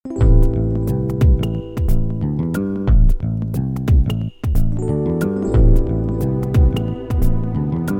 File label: Halte Musik SLOW FLOW; FritzBox